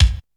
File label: 2000s clear bass drum single hit a sharp key